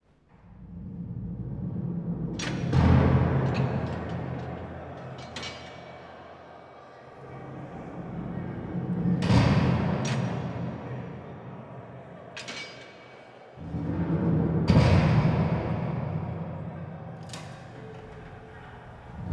Void Ambient; X2 Wolverine's Revenge; Nintendo GameCube

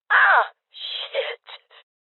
Voices Injury Female Old; rebel cops 2019 gamerip

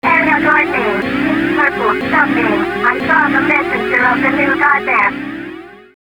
Warning; Thunder Force V; Saturn

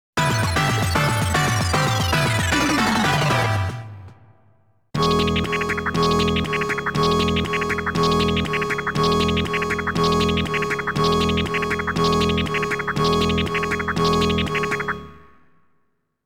GAME OVER~CONTINUE; r-type ii g.s.m. irem 2

